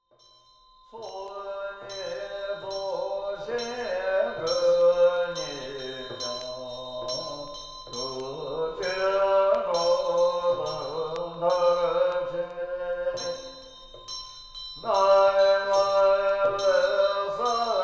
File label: chime drig